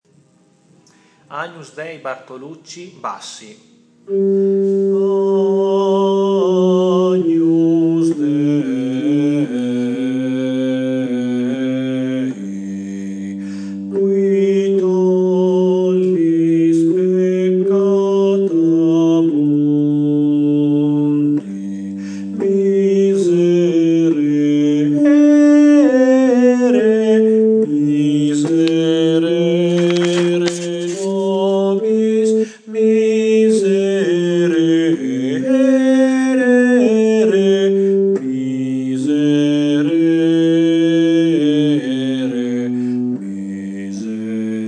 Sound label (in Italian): bassi; padova 2013; agnus dei; bartolucci